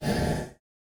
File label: Zoom Chunk; Insanely Twisted Shadow Planet